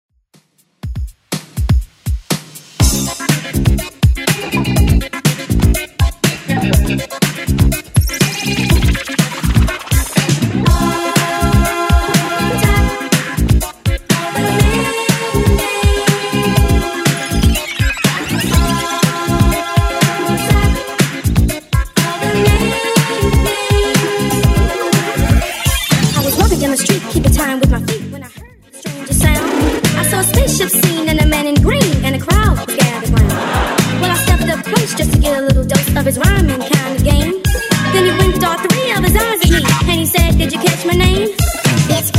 80s Redrum 122bpm

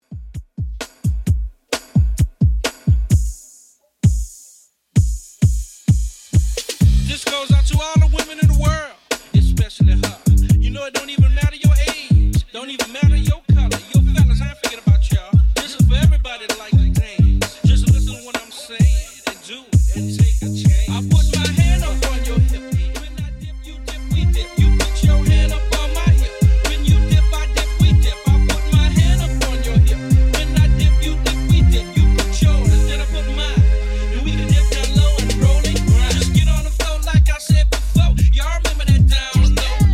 90s Redrum 130bpm

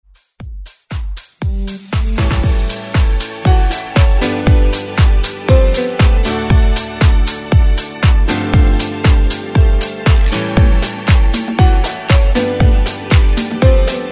Dance Ext Redrum

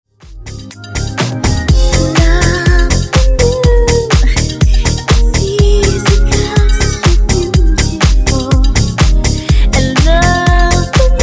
Disko Funk Remix 123Bpm

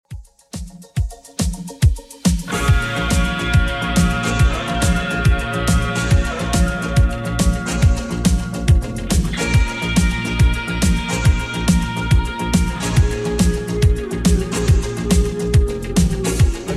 80s Redrum 140bpm

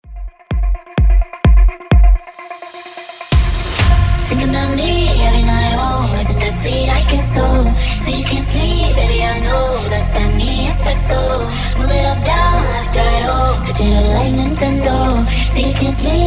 edm breaker 128 bpm

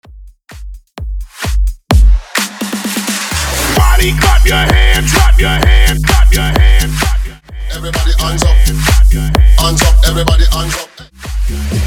bootleg hype rebuild 129 bpm

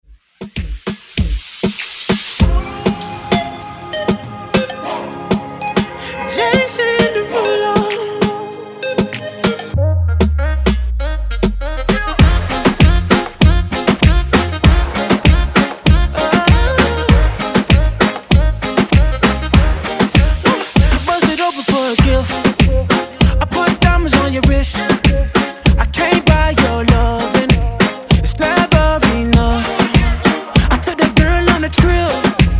Moombahton Redrum

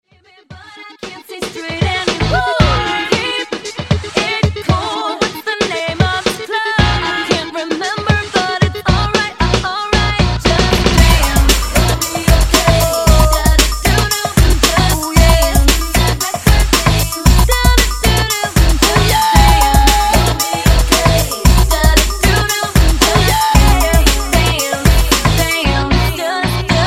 Funk Wordplay 115

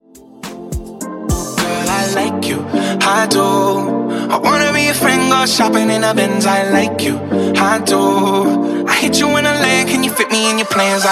Top40 Pop Dance Redrum 105

